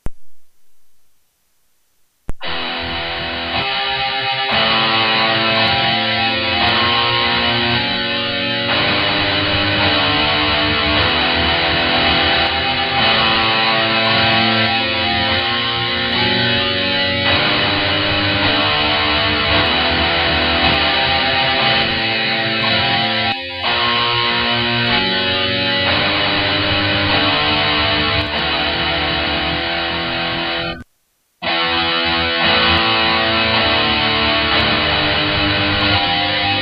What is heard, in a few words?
music electric guitar power chords